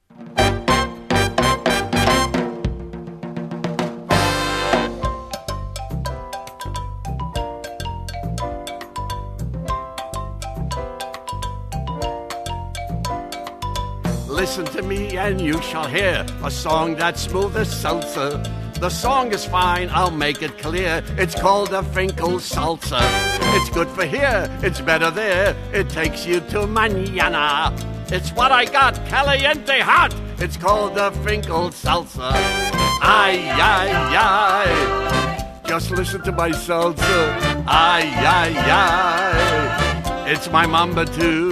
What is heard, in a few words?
Salsa